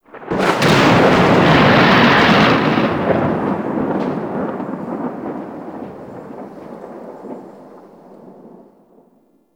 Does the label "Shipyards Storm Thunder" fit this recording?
Yes